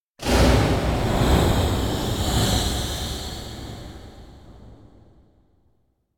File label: Whoosh Hover Air; Hollywood Edge; sound designer's toolkit v2